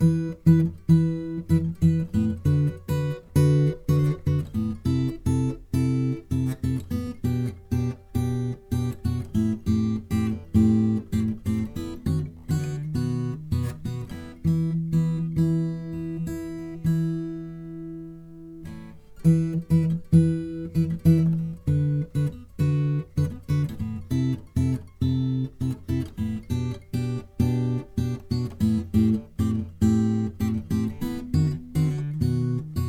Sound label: Acoustic Guitar Em 100 Bpm Loop